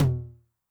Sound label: HI TOM MOD Noise, 909mod; classic machines; HT - HI TOM